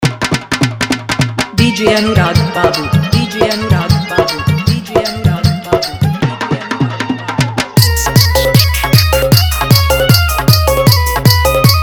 Full Dhollki Bass Dance Mix

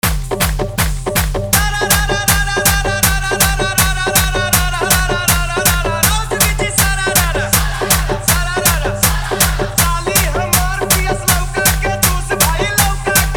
BhojPuri Holi Full Hard Bass Dance Mix

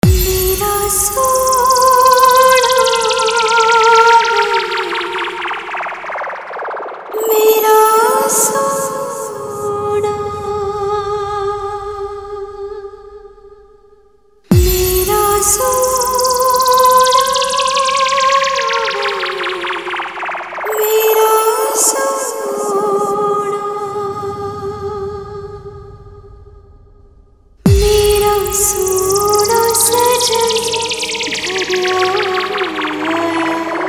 Sad Filter Pad Mix

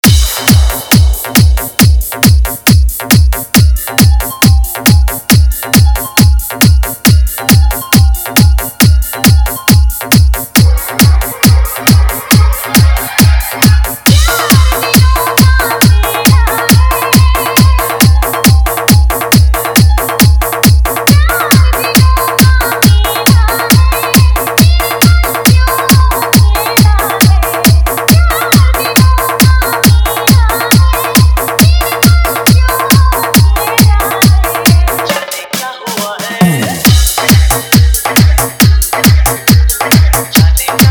Full Electro Bass Dance Mix

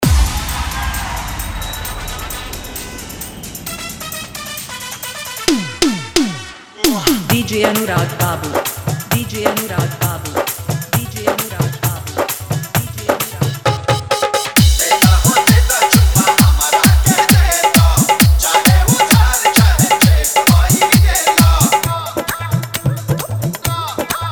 Hard Dhollki Bass Dance Mix

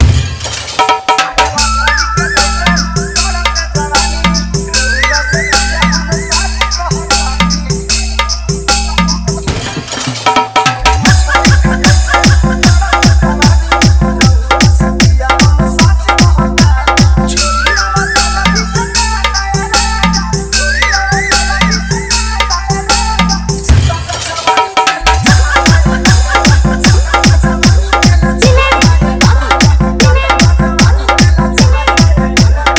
Full Bass Song

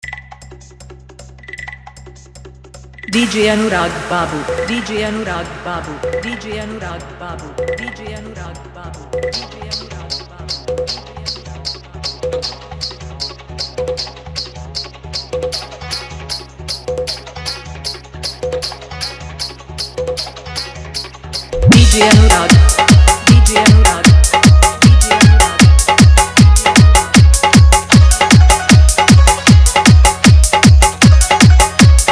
Full Dhollki Bass Rod Show Garda Dance Mix

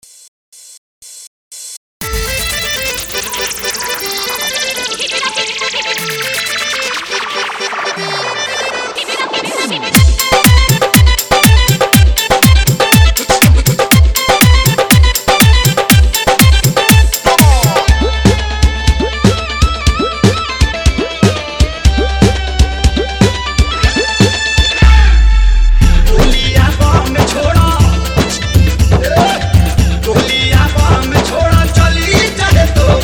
Bhojpuri Full Dhollki Remix

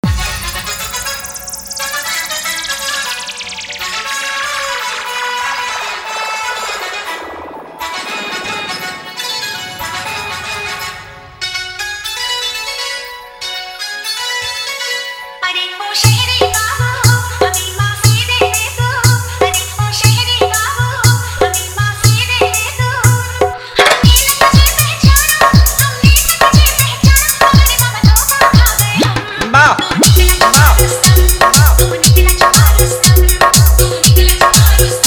Old is Gold Jhan Jhan Bass Mix

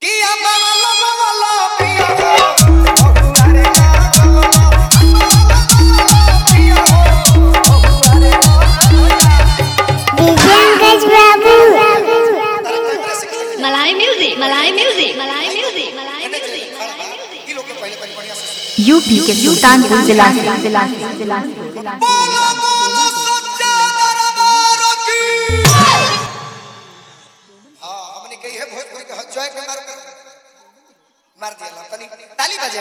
Jhankar Music Mix; Dj Ankaj Babu Surapur

